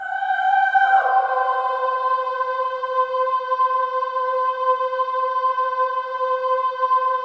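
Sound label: Voices, INTERVALS, 5TH DOWN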